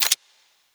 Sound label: Weapon Foley